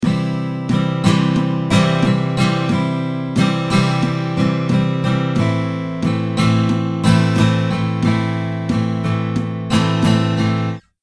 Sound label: strumming pattern